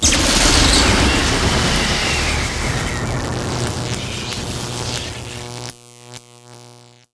lightning origin

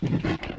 attack

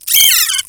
hurt; mahlendur

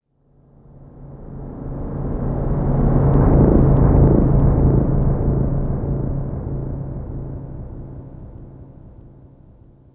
ambient tone dark

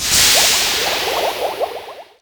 COOLBLADE

